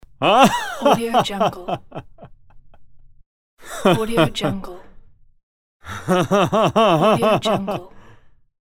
male laugh

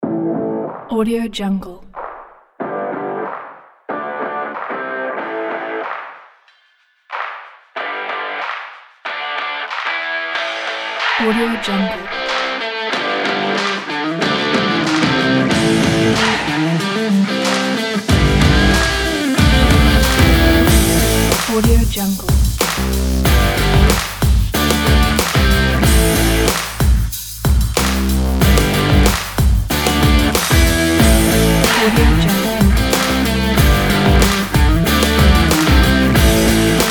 hard rock power extreme kit

